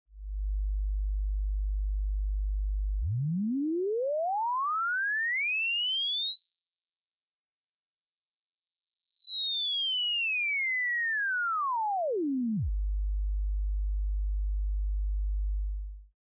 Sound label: Sinewave var Frq